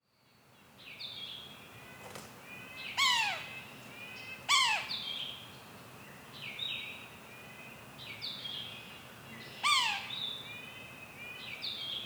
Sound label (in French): pic maculé mew, Cri 'Mew'; rimouski; lac caron